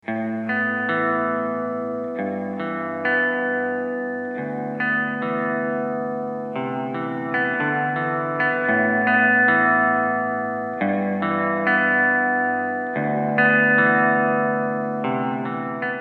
Sms Display

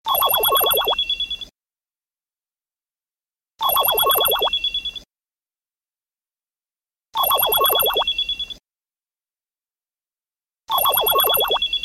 Ring

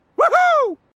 Woohoo

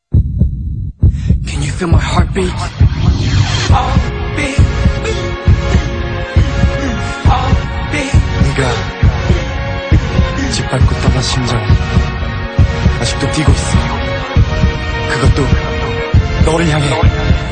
Heartbeat Intro

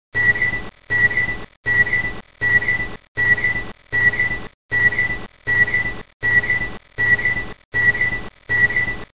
Motion Radar; Aliens

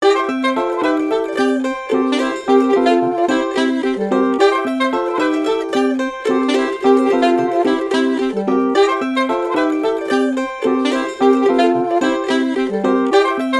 Happy Saxes

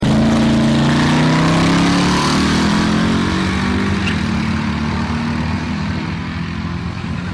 Drag; 305 At Brainerd

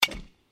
baseball; 365scores

